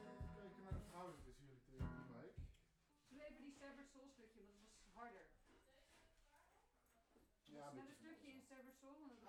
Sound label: Bass Mic